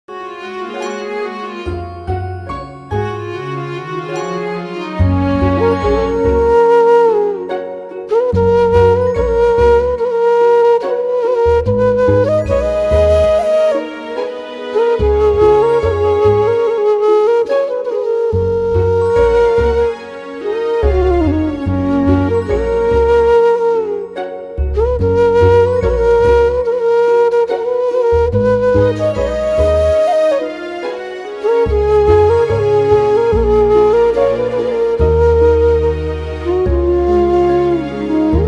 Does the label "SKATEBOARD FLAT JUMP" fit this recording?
No